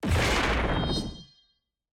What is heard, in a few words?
sfx eye; samira